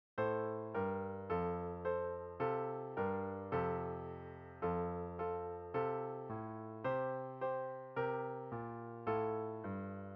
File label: Solo One F Brass; Development Band Tuition